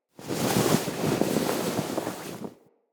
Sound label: Tent Cloth Rustle, Interior; Soul Plane